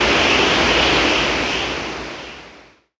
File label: Door